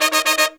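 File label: Pop & Funk Brass, 110 Eb, right; Best Service ProSamples vol.25; AKAI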